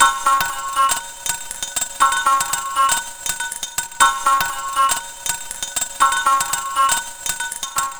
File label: Goat Bell; Electronica; Metal